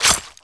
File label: knife hit, weapons; garrysmod